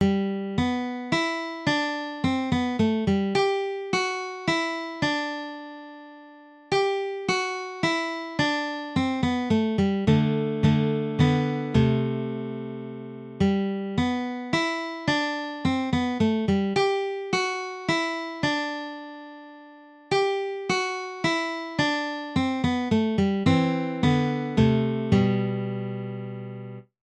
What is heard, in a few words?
guitar